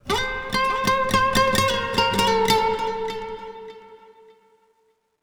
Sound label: Oneshot Acoustic Guitar; Zombie Army 4 Dead War